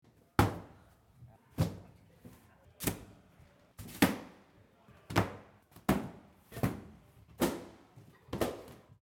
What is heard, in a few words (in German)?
Judo Matten stapeln